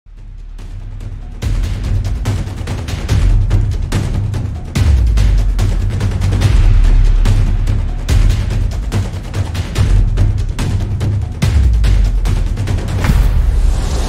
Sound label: horror music action background music; for videos